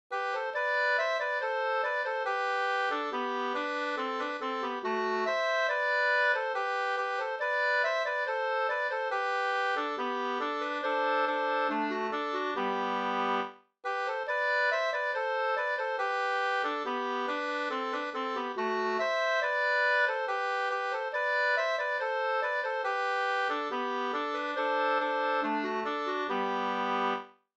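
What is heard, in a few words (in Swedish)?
brudmarsch Are brudmarsch 2 stämmor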